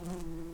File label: Lapidarius workers, bumblebees